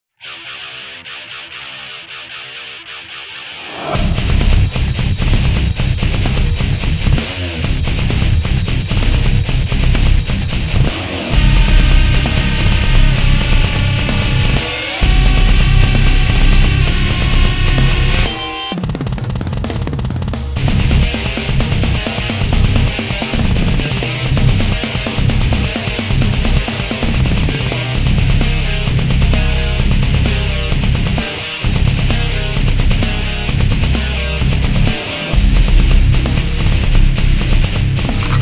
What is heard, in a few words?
GryphonCore Instrumental